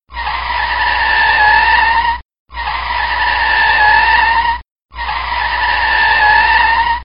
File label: street sound